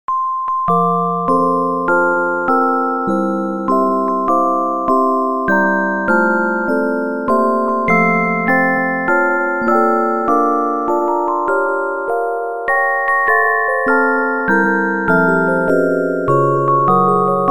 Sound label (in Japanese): ハッピーバースデイ レトロケータイ着メロオルゴール